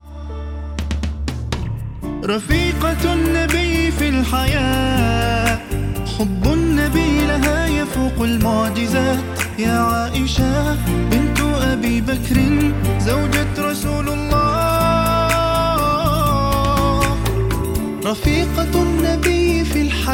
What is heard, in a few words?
ARABIC